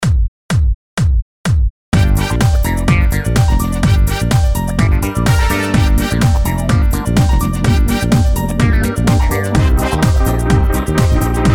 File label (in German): Blues Kiste